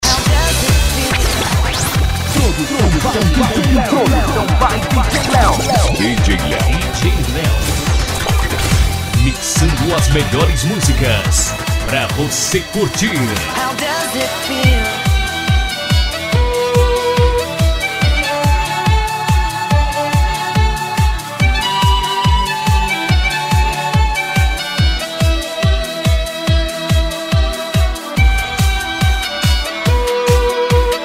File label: electro house dance